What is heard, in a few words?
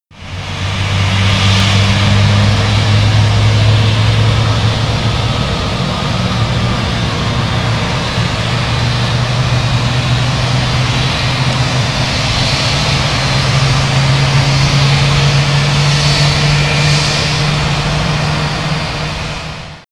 v2500 startup rear, A320; external